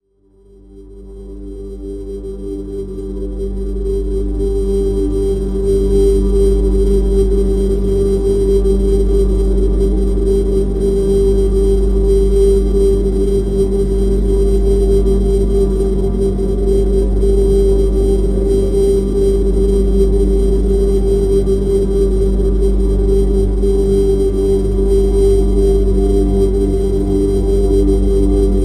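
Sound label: drone; Neutrality G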